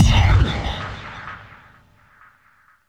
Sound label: Scratchy, FX; Vengeance Samples